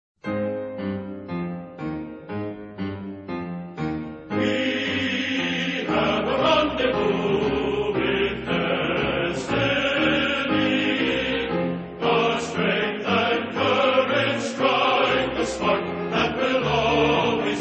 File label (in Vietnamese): HanhKhuc; Hoa Ky